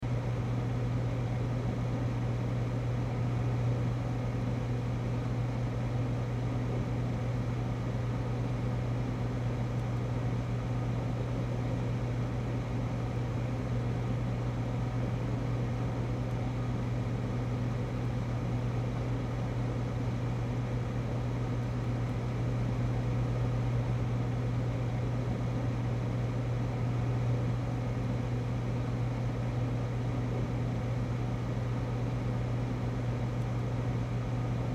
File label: Freezer Hum, Freezer Hum Crackle Kitchen Loop Restaurant; Appliance; Industry; Appliances; Blastwave